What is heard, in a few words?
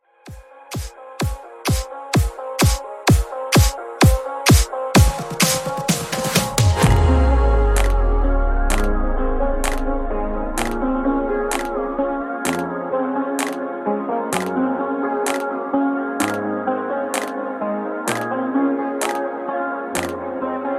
Bass house, 128 Bpm; Rework Edit